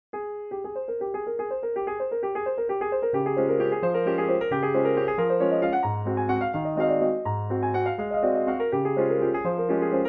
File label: Close Piano Hall